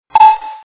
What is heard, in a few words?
webchat incoming classic